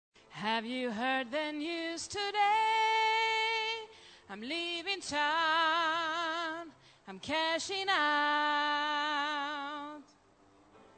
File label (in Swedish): bas